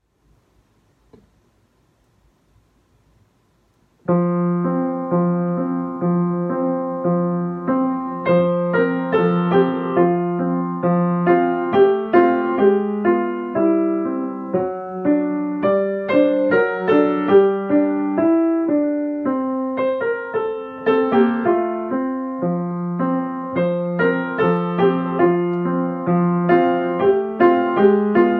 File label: Easy Piano